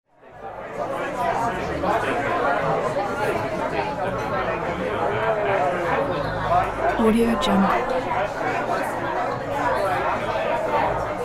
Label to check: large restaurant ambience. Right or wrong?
Right